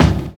60 S BEAT; Univers Sons - Kitch 70s

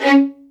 C#4, LOUD VIOLINS; Q Up Arts; Denny Jaeger Master Violin Library

